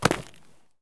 landing concrete; Escape from Tarkov

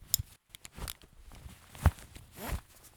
splint middle; Escape from Tarkov

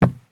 sprint wood; Escape from Tarkov

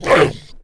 Wolf Hit Vox; Civ4 warlords

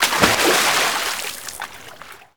sewer splash; gulag; Call of Duty Modern Warfare

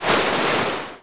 Ocean Spray; Civ4 warlords

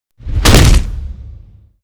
kickgun stomp hit stereo; Modern Warfare

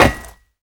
land metal, footsteps

